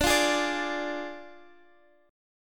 D Diminished Guitar, chord; strummed; 21,20,20,19,x,x; 4F,4A,5C,5G,5C,6E

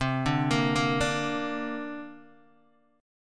C 7th Suspended 2nd, Guitar, DADGAD

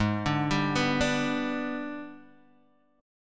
G sharp Minor Major 7th Flat 5th, Guitar Open G; x,1,0,0,0,0

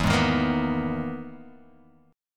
E-Major Sharp 11th E, Guitar Open G

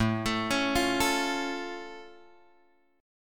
A Augmented A; A+ Chord {5 X 7 6 6 5} arpeggiated

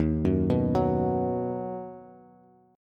Eb Minor Sharp 5th Bass; Ebm#5 arpeggiated; JGuitar

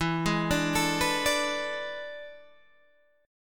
E 11th, Guitar, Open G

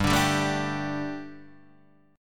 G Suspended 2nd G, Guitar; Gsus2 Chord {3 5 5 2 3 3} strummed

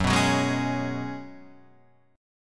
F sharp Suspended 2nd Suspended 4th; F#sus2sus4 Chord {2 2 4 1 2 4} strummed

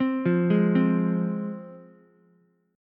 F Minor F down Ukulele; arpeggiated; JGuitar

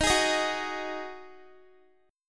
Eb Minor Double Flat 5th, down, Guitar; strummed; 4E,4A,5D,5G,5C,6F

